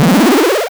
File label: SFX Item